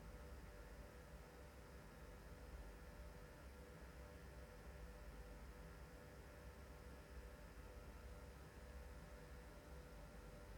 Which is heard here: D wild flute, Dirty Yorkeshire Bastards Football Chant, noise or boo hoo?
noise